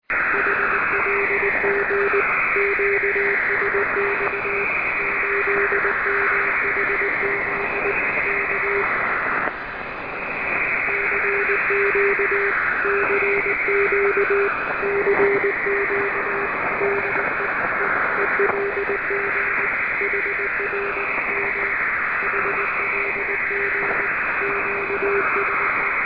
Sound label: 8484 kHz; HLG Seoul Radio; South Korea; FTDX5000; Schwarzsee